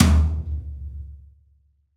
New Brush Tom, Roland BK-5

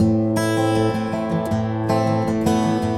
A GUIT A MIN, 80BPM; Best Service; World Colours

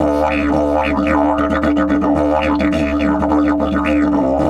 108, DIDGE 108BPM

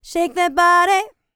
licks, vocal, dance; ILIO; Vocal Planet

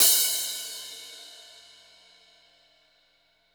CRASH, JD DRUMS KIT; EdgeSounds; Drum Mashines VOL-1

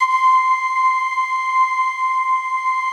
ST ORG, STRINGORGAN, Yamaha VP-1

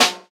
Snare, jungle breaks drum sounds; ORIGINAL JUNGLE BREAKS; JBK DRUM SOUNDS; JBK SNARES

